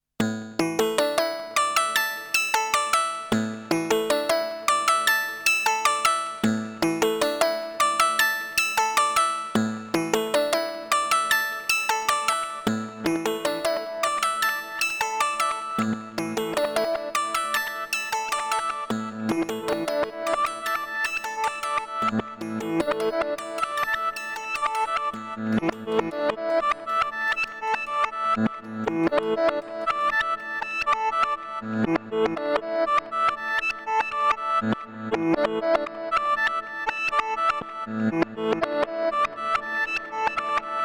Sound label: ASM Hydrasynth Reverb Freeze